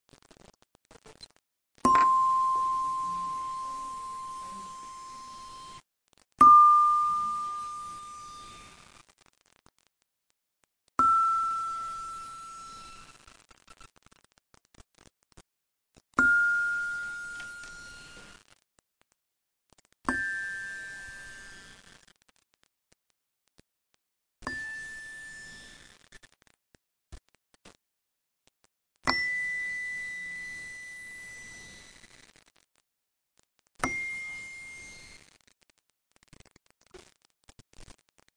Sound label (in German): Glockenspiel, Tonleiter